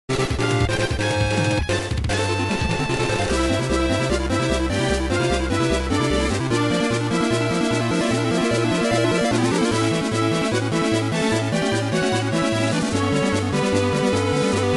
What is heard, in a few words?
win; Magic Tower Night Crafting Story